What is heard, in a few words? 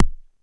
DX 11 Kick